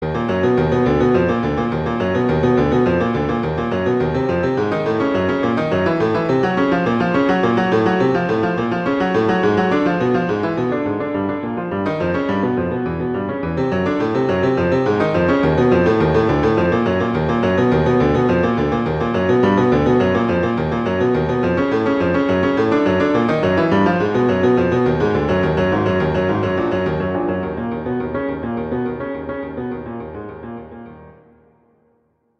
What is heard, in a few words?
in E flat minor, Allegro; Chopin; Preludes